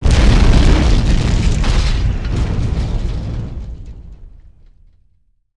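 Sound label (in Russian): взрыв с разрушением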